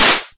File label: pipe hit; VIDEO GAMES; carnival